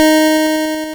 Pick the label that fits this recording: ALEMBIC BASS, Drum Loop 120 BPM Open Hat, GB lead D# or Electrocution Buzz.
GB lead D#